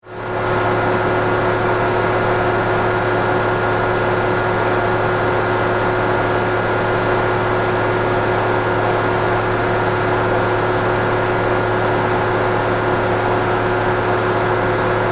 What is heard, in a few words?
Ice Rink Refrigeration, Room, Ambience